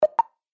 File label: start mini game